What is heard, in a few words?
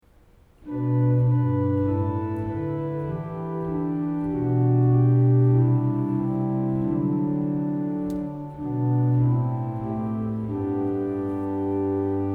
Gather Us Together, wedding music